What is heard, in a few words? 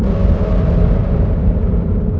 SIREN; Rubber Chicken Software Sample Bank